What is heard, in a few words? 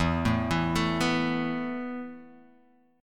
E Suspended 4th E, down, Guitar, Open D